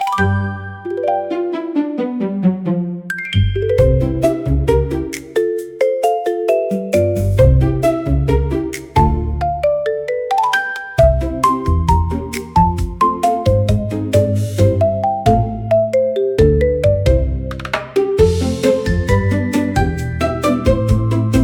Papelitos y Marimba, comical marimba for funny scenes